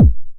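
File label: 909 BD tape, kick drum; Goldbaby Tape808 & Tape909; The Tape 909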